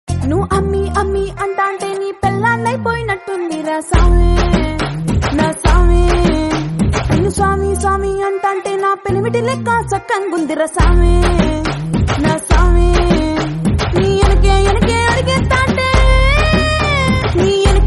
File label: telugu song